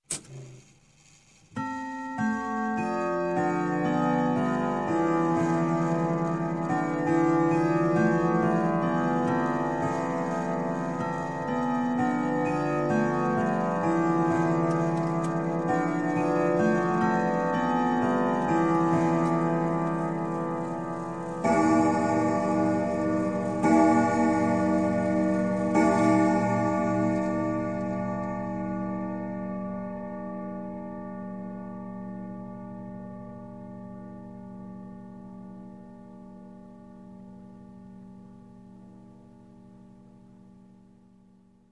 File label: Clock, Grandfather Whittington Chime - Strike; Strike Three; Sound Ideas 2000 Series